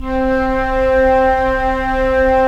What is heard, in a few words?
FLT FL, Flute; Roland - LA Composer vol. 4